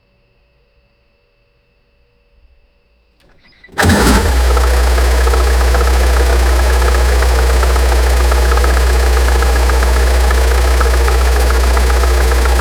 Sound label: diesel motor starting idl, engines